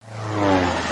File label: Special plane